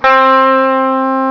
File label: Guitar; Sound Library 2000; Wayzata Technology